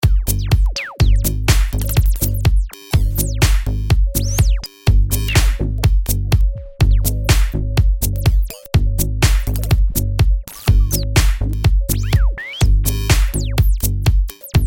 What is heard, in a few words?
Hi Tech House